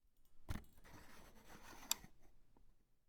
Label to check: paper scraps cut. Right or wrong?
Right